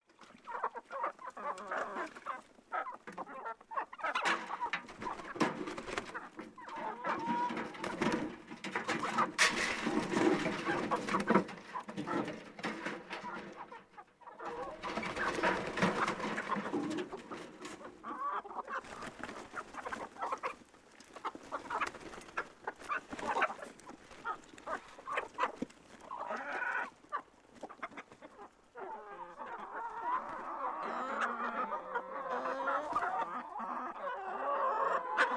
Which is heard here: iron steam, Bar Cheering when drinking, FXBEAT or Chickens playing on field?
Chickens playing on field